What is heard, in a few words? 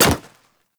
Hyena Micro Step Default, Footsteps; Warframe